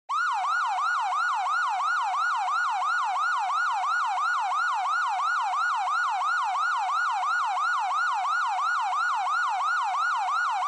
Police Car Siren Close

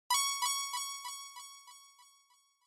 Onoma Inspiration High Delay

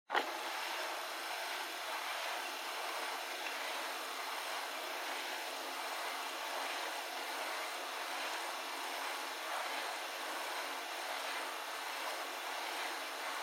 Portable Cassette Driving Noise Play Stop

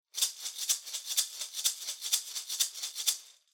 Maracas Pattern

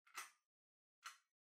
Pendant Light Far Pull

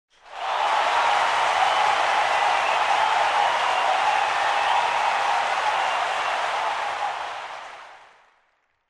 Cheer Crowd Mid

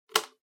Tabletop Timer Digital Toggle; タイマー機器

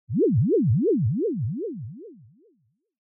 Fantasize Low; シーン・妄想; 低

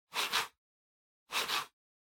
PC Mouse Move Hard Pad Short

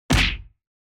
Hit Punch High

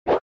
SNES Baseball Swing

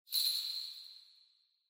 Ring Bells Reverb Shake

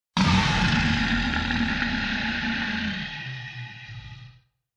Monster

